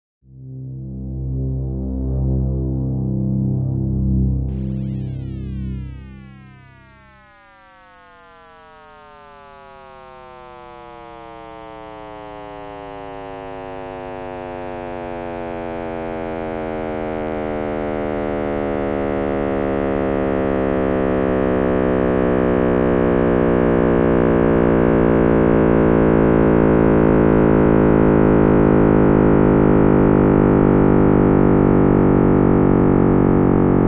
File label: Big Bang